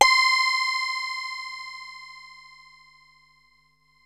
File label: BASSWEEP; MORPHEUS